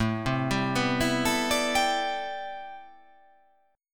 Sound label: A Minor 11th A, Chord